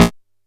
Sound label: A#2, Bass; E-MU Producer Series Vol. 6 – Analogia Project; Boom da Bass